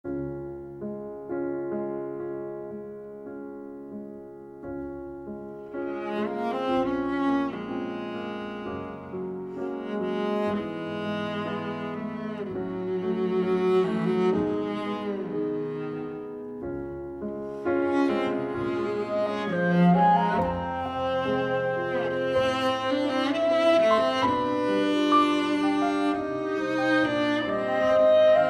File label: instrumental